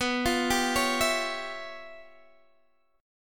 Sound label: Db 7th Sharp 9th Flat 5th